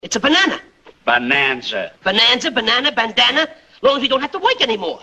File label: Jingle